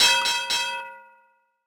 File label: time Up; HeavenStudio; quiz Show